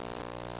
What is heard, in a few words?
Radio; flashkit